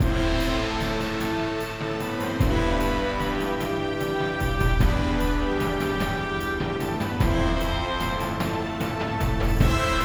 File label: Epic Hero Adventure; Jamie Coleman, Film Composer